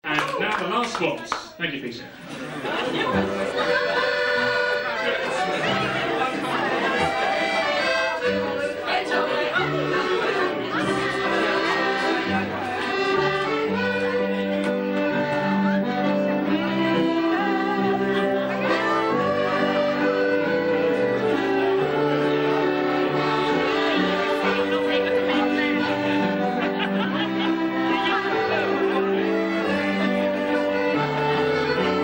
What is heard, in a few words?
waltz